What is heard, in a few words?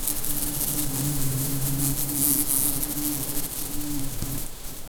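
smoke hissing bees buzzing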